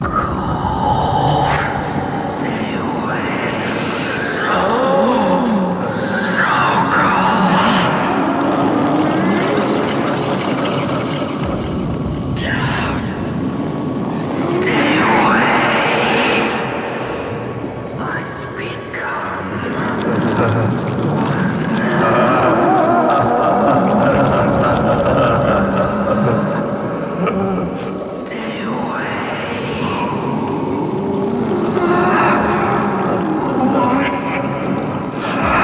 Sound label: Modern Male, Couple, English; fatal frame 20th anniversary celebration soundtrack